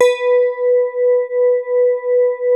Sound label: E HP HARD H3, SYNTH PLUCK; Music Store - Claudius Diemeter Schwerlos